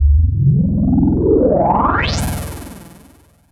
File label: BassStation